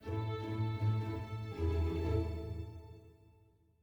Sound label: heroic soft end; gamerip